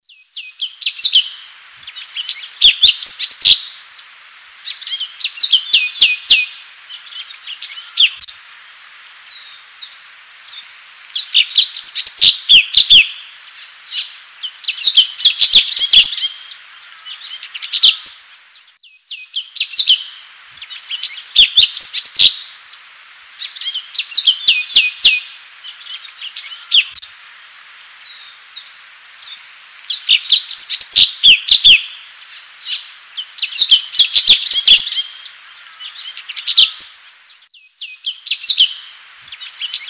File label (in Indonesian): suara kicauan cucak cungkok